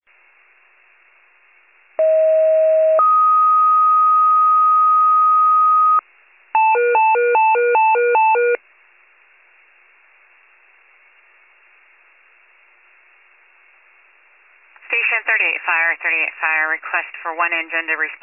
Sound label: FIRE pager alert